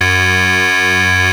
OSCAR F2, Rare Synthesizer; Hollywood Edge - Giorgio Moroder Rare Synthesizer Collection

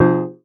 stab plink, stabs

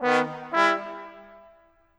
Trombones, Orchestral; Sonic Foundry (Sony Creative Software); Orchestral VOL-2; Modern 35

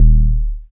BAS FM DX-7

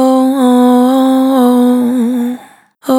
Single Voice Melodic Loops, Vocal, Dry

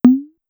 Tom 808 Up Slow, Pitch Env Various, Clavia DDrum; Clavia DDrum-AT

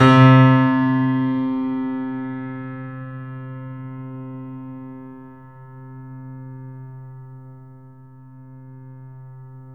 The Piano, sustain; YAMA SUSTAIN; InVision Interactive

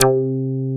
REZO BASS; Masterbits; Dance Trax

